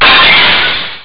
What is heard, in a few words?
shrapnel; ships; chenjesu; sc2